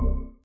dialog Popup; europeanroulette3

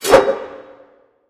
star jump; goldentavern

